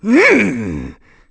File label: Sumo Escape; Sumo match: Bo escaping Link's grasp